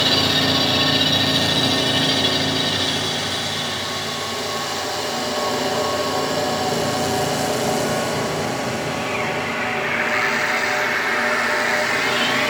Outpost Interior Amb; Warframe; Corpus

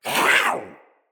Feral Vocal Attack Short; Catbrow

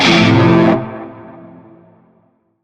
Shawzin Maj Chord; G; Warframe; Narmer Shawzin

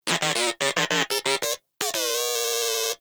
Moa Inquisitive Vocal Kata; Pets; Warframe

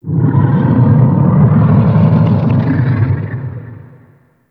Golden Maw Warning Vocal; Ambience; Gameplay; Warframe; The War Within

